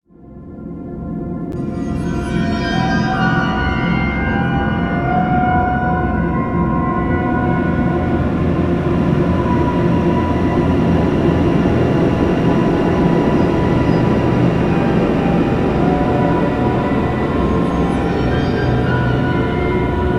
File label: Factory tension loop 160